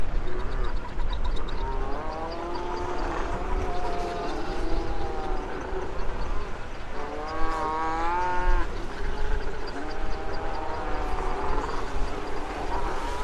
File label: northern fur seal rookery wind; Gorbatch